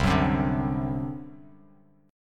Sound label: C Suspended 2nd Flat 5th C down